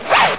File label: zipper up